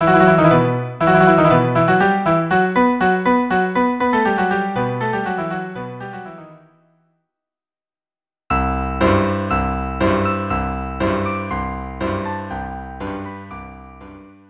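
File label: violin viola cello; Anvil Chorus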